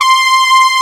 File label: SYNTH BRASS, ROLAND; USB Soundscan vol.49 - Analog Fantasies; AKAI